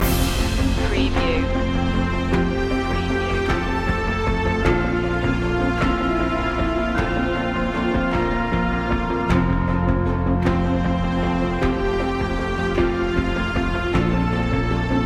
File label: sailing to the end loop